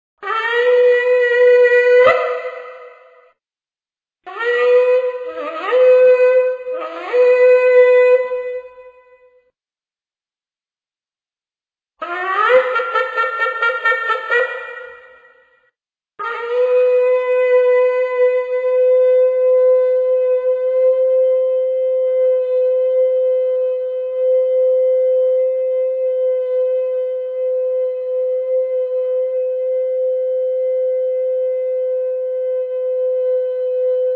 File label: Shofar Sounding